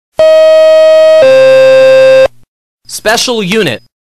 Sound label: FDNY tone